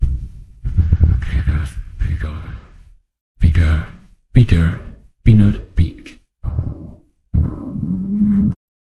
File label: jingle